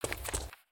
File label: Pet Cat Turn; creatures pets